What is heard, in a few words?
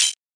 nasty stormfront, Electro FX